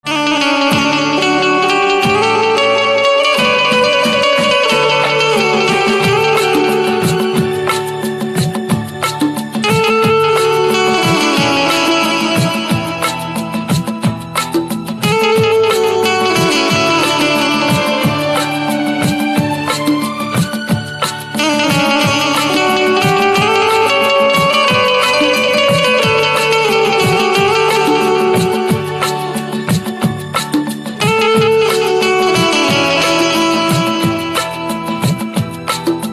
Banjo Instrumental Ringtone

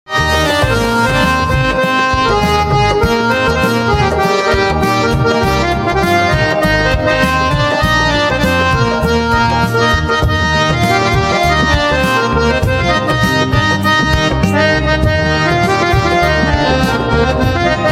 Harmonium Instrumental

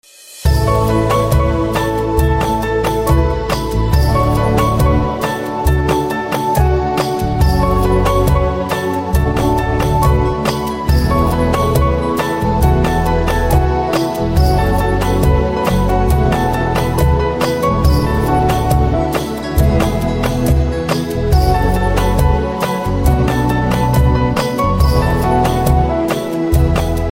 Piano Instrumental Ringtone

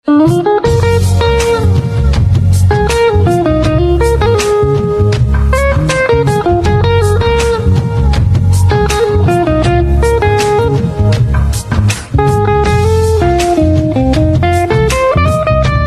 Guitar Music Ringtone

